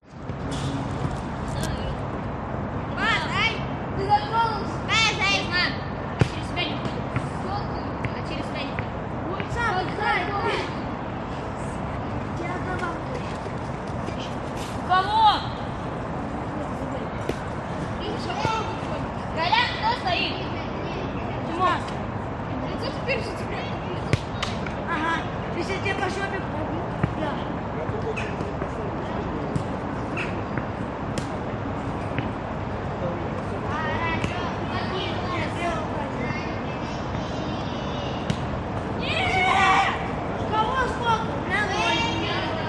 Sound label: moscow russia children summer close distance sportbox small group of children